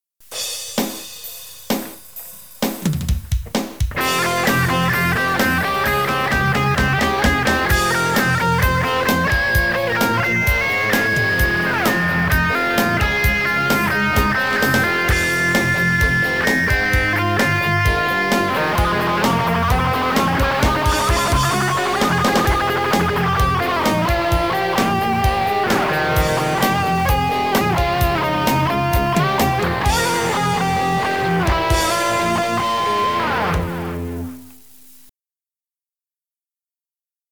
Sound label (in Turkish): Emprovizasyon Micro Brrr